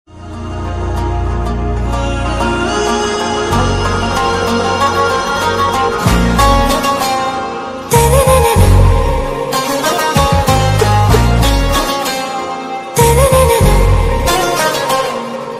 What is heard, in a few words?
Telugu bgm ringtone; Kushi; vijay; samantha